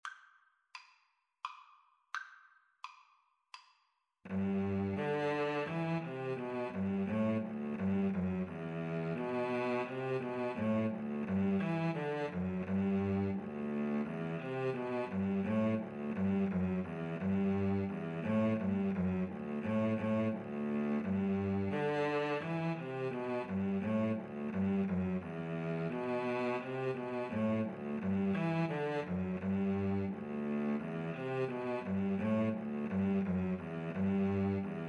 hornpipe Viola Cello; Viola-Cello Duet

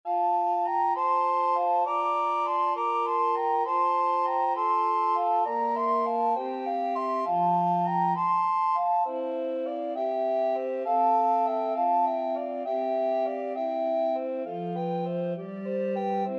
pastorale, recorder quartet

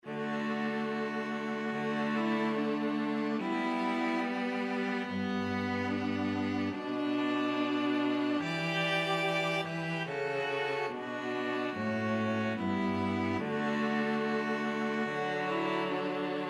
deep river string trio

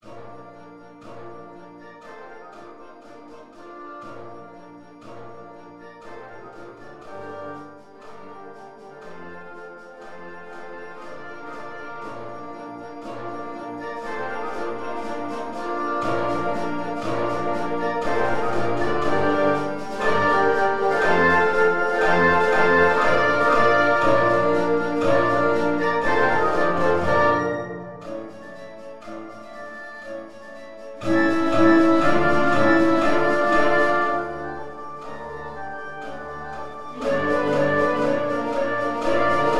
orchestra

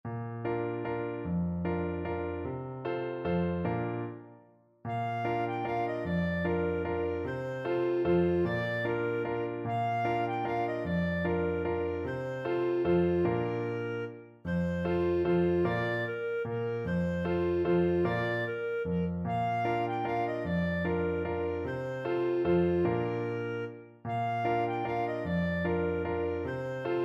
lieber augustin, clarinet

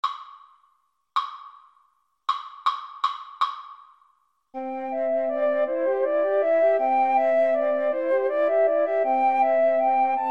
Flute Trio

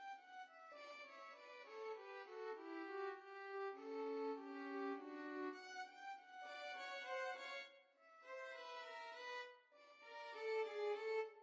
la virtuosa violin duet

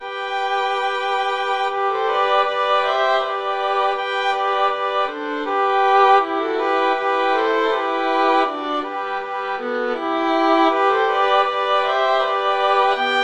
violin trio; slavonic

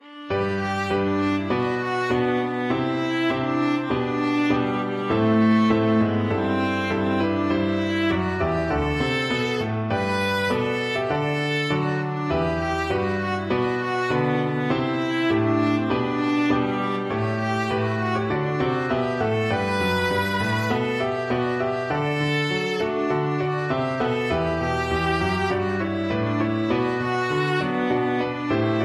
national anthem russia, viola

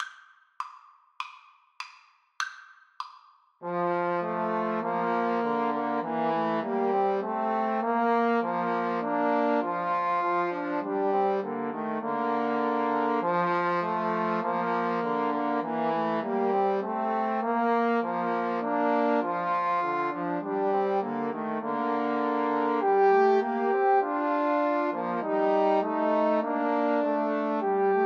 Trombone trio

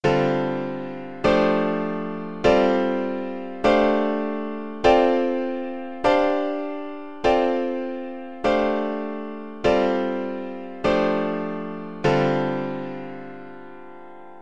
piano

